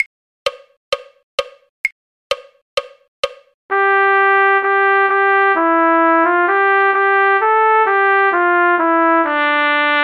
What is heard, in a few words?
trumpet; All in a Garden Green; folk